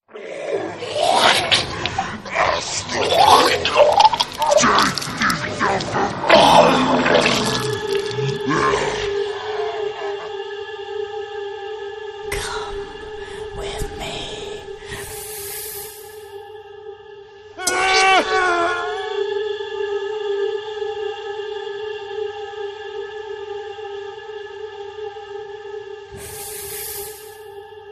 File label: sounds of horror ringtone